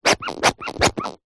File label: leisure toy rubber duck squeeze no squeak